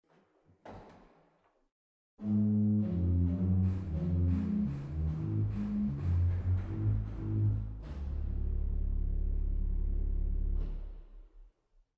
Gross Principal 16, organ; waltershausen

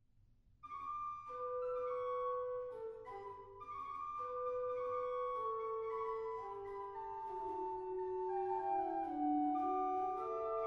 Querfloet 8, organ; stade

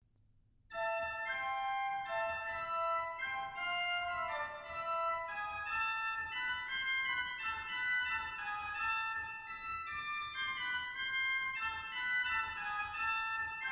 Mixtur, organ; stade

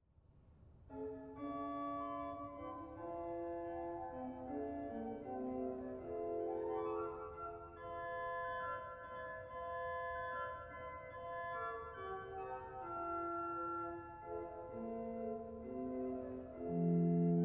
Fernfloete 4; dortmund; Sauer

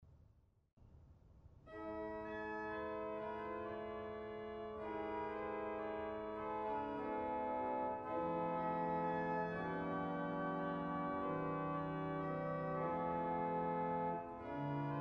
Geigenprincipal 8; dortmund; Sauer

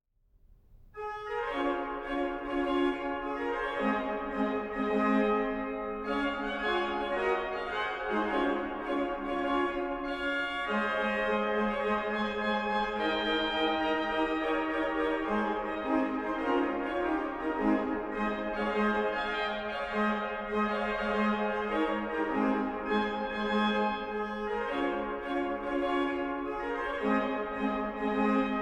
Marche D-Dur - Flötenuhr; ottobeuren; Flötenuhr 1793; Hob. XIX

